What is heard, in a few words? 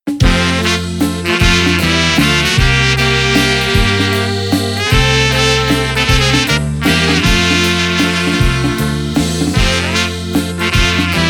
Czech Farmer Waltz